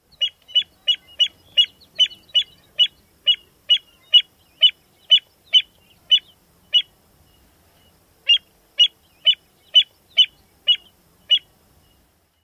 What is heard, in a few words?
kweep calls